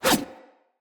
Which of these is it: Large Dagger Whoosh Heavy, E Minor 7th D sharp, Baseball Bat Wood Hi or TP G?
Large Dagger Whoosh Heavy